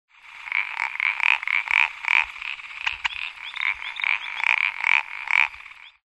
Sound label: Aglyptodactylus laticeps